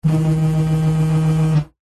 household mobile phone vibrate on surface slight rattle